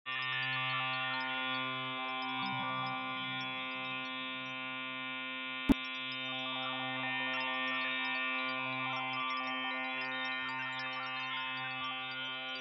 Abstract dreams

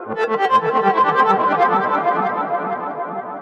TECHNO EXTRA 140

